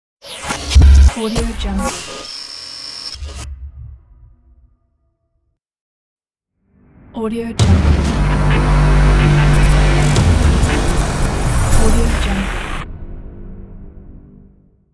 glitch logo

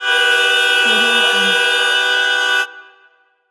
car signaling

